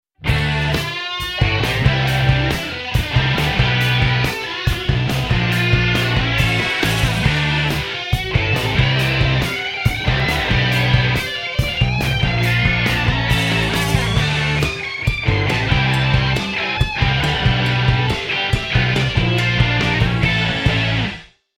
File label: guitar